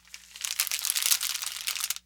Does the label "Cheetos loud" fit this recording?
Yes